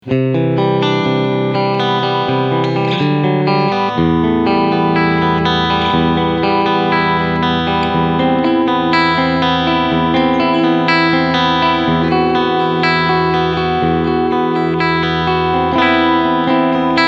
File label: Clean